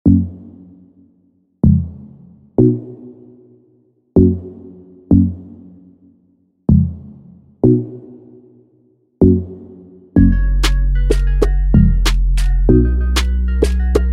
Instrumental

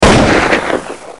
AK47 Gunshot sound effect

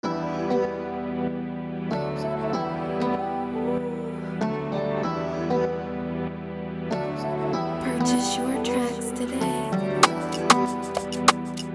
96bpm G major

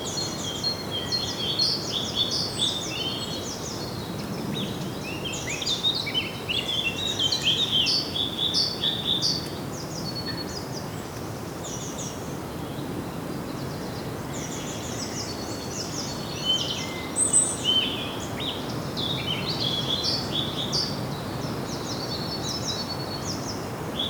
Forest sound effect

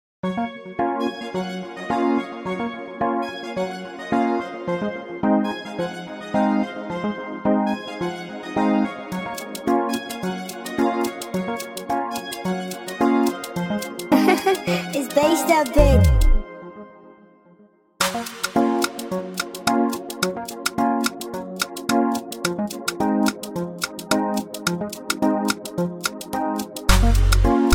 Instrumental Acoustic

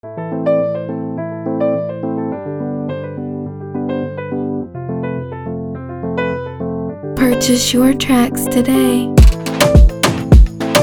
Free beat, 105bpm A major

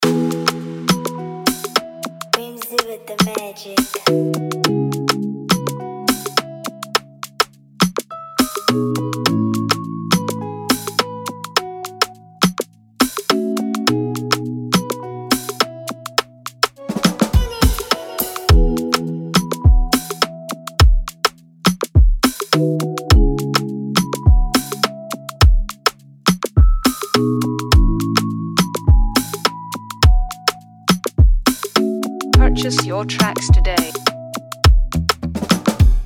Afrobeat Instrumental With Hook

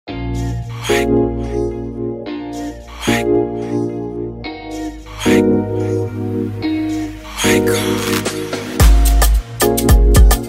Afrobeat Instrumental

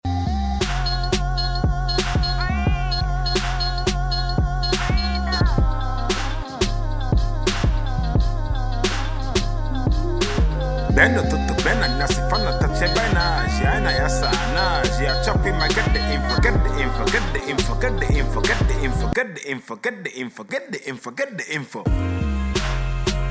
Instrumental

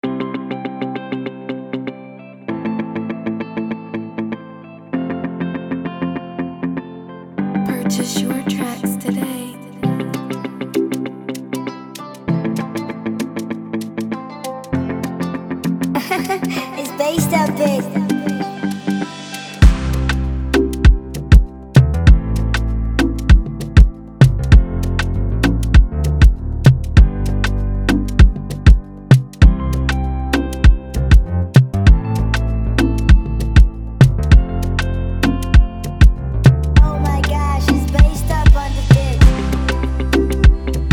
Free beat 98bpm C major, Afrobeat Instrumental; Type Beat

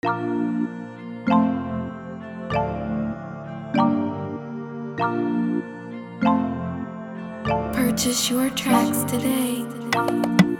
Free beat, 97bpm A major

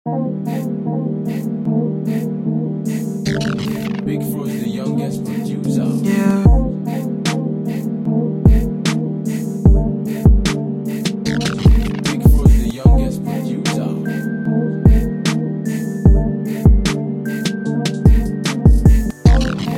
Instrumental

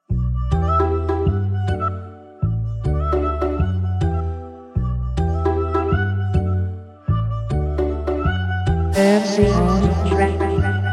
Afrobeat Instrumental

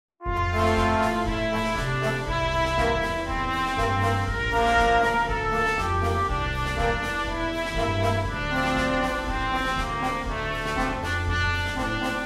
Anguilla national anthem

